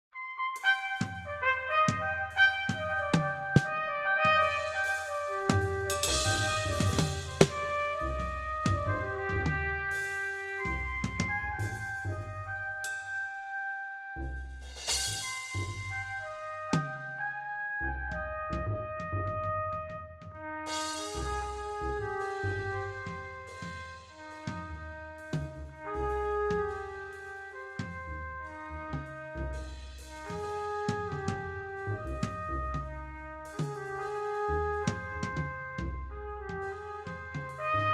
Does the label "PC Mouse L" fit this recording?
No